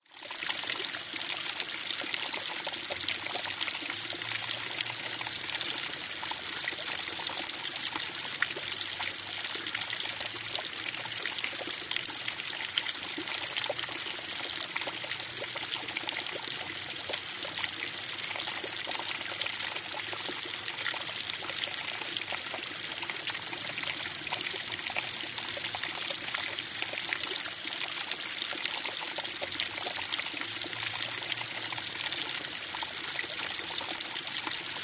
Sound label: Water Brooklet Fall, streams